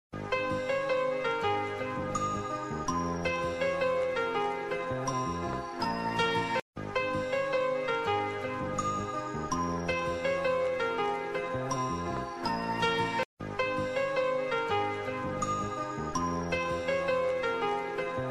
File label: acoustic